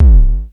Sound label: BASS HIT, Dual Percussion Synthesiser; Klone